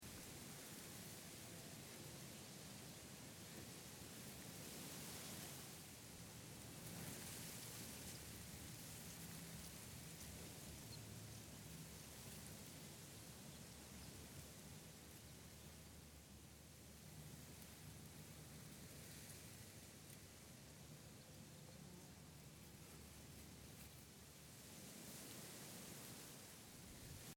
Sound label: Wind Over Wheat Field Loop: A moderate wind blows across a wheat field; Can also be wind through trees, leaves. Seamlessly loop; Wind Sound Effects; Lynne Music